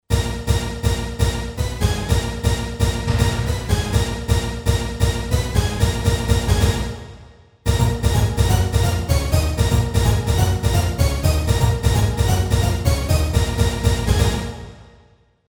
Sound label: Orchestra Hit, Yamaha PSR-550 XG sounds